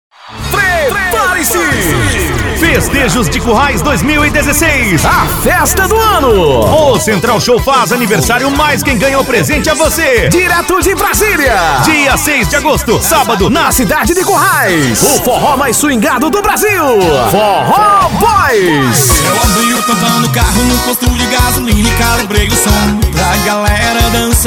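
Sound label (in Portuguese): show forro ao vivo; festejo currais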